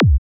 Kick; Parandroid; parandroids sample foundry vol 1 sample pack

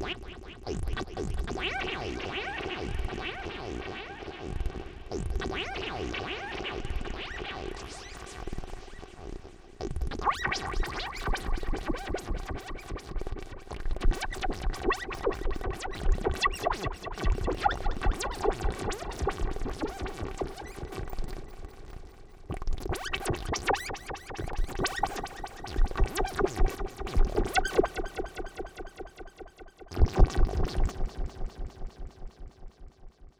Squelchy

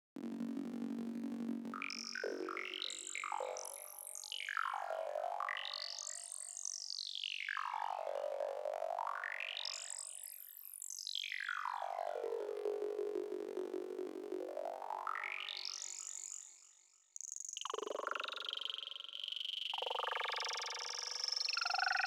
Beautiful Comb Acid Line

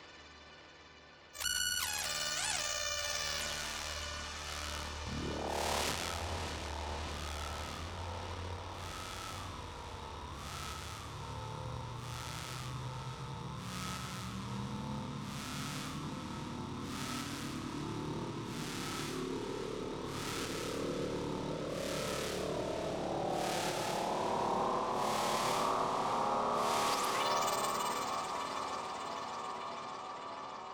Doom and Gloom Drone FX

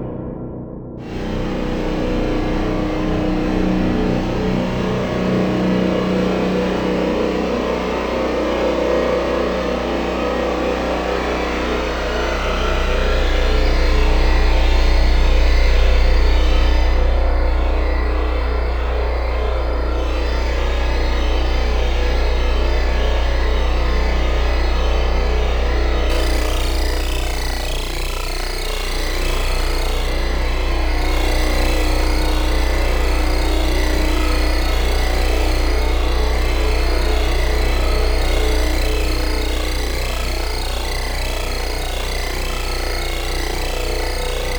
Hardcore Drone; Parandroid

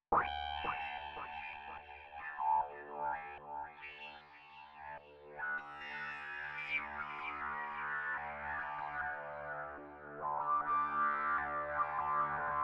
Doodlesack; Scottish Alien

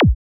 Kick; Parandroid; parandroids sample foundry vol 1 sample pack

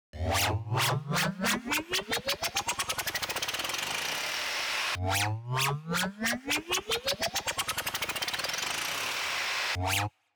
FX Uplifter; Audionerdz Hightech Foundry Volume One Sully

